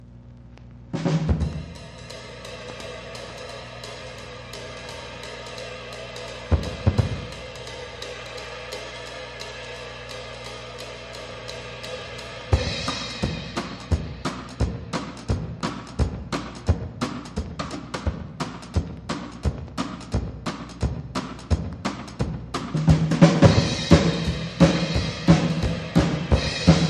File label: Drums